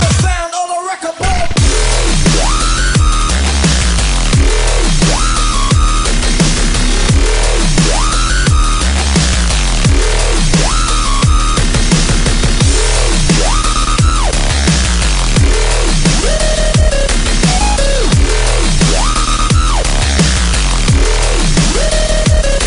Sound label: dubstep